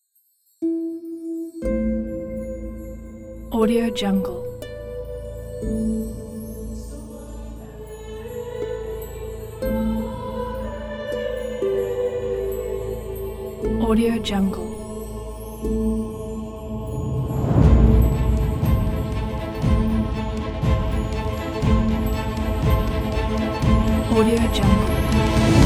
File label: heroic epic fantasy adventure